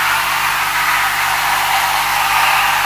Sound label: Sand Wheel Noise; Ultimate Fishing Simulator 3 Demo